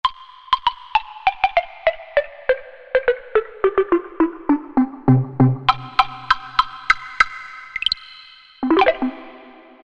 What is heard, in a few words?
Block, Korg M1; Factory preset examples